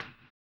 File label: HAMBONE, Vocal Blues Soul; Spectrasonics; Planet